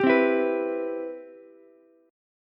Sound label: C Major 7th, C, down, Ukulele; CM7 strummed; JGuitar